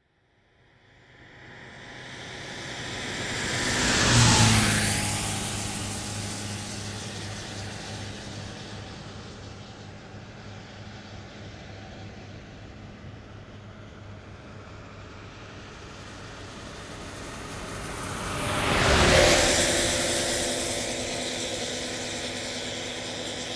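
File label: cars, traffic jams and car traffic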